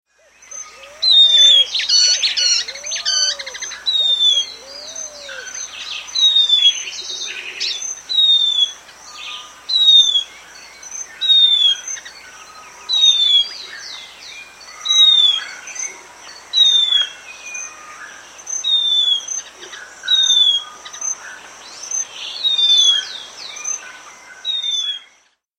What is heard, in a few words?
Unicolored Antwren, S. America